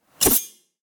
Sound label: sword dash; voxygen; sfx